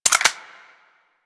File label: Eject Clips, Weapons Reload; Ak Latron